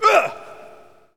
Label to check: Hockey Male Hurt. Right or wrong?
Right